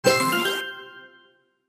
Level Complete; Red Ball 4 Vol 1

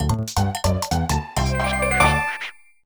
Collected Snow; Raposa

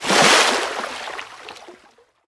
jump; Piranhas

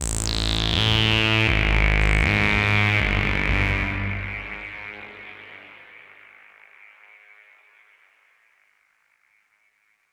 Oneshot Synth

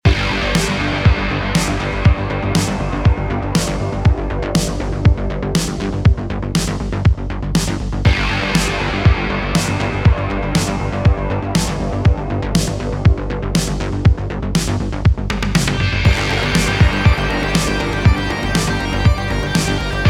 Boss Theme Music

